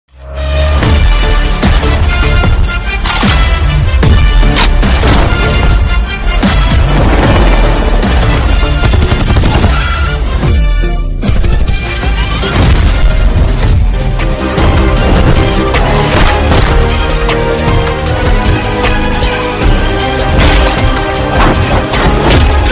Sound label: trailer bgm; vijay